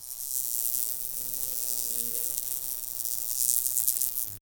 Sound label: bees crawling sticky hon, buzzing